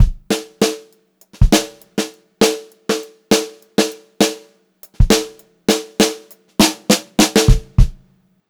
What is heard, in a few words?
FILL, Funk-Groove Drumloops